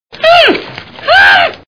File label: ummmh; three stooges